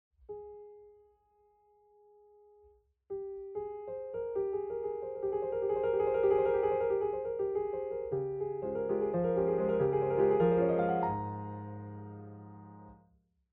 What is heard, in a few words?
Romantic American; Arturia